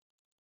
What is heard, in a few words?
Myotis daubentonii